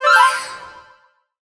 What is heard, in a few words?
Collectibles Classic FX; Cymatics; Video Game Sample Pack Vol 1